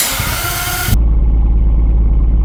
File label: startup, vehicles; 1gzfe